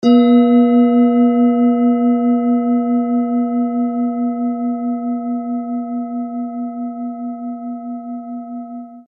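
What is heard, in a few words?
tibetan bowl, b flat; moon absiden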